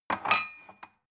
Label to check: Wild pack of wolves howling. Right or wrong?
Wrong